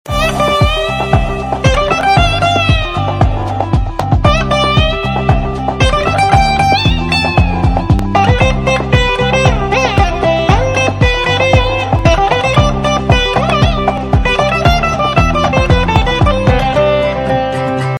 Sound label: Tamil instrumental ringtone